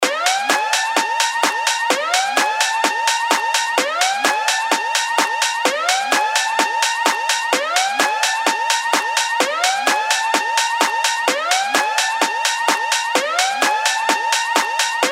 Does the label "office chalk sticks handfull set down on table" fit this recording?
No